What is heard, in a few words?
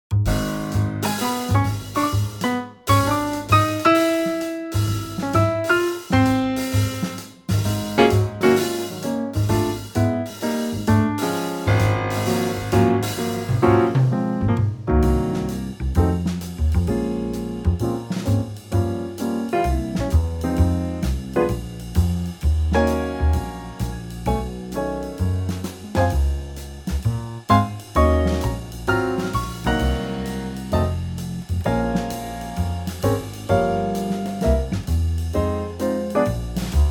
Db Trio